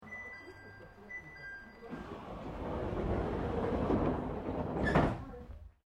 Train JR Regional Aomori Local Door Exterior Close, Japanese Trains